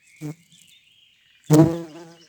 Vol de bourdon court long; Vols Bourdn abeilles

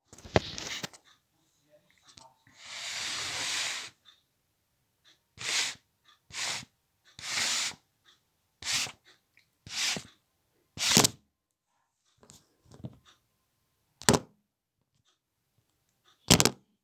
bruitages chute clefs

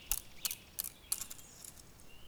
4x Brins de dilles, Percu Bois

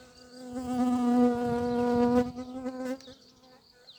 Bourdon Colibri top vol; Vols Bourdn abeilles

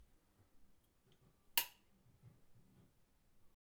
Clic Bouteille Aigu; Bruitages

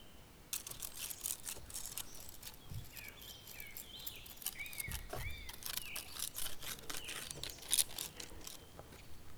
Frottements Brindilles sèches; Percu Bois

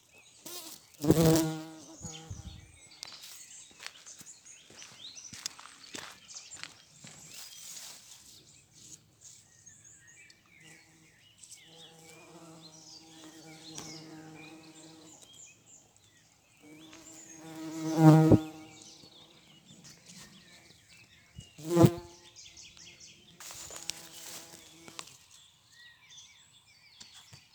Bourdon Filigrane; Vols Bourdn abeilles

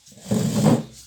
Grondement de chaise; Bruitages

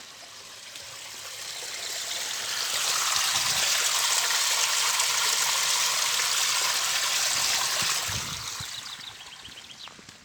Coulis de l'eau grille fontaine; Bruitages; Eaux

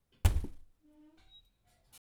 Kick sourd dans un tas de papiers

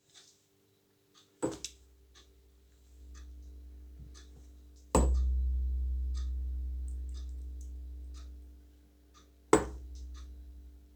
bruitages cuisine

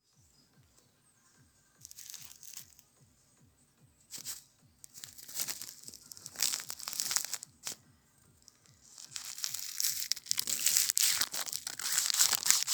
bruitages roulage

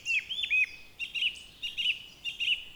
1x Trutiduu 3x Tudii, Oiseaux

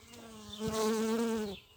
Abeille cresc atterrit; Vols Bourdn abeilles

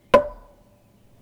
Gourdin buche Tum equilibre; Percu Bois

